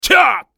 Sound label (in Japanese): マスケッティア男 攻撃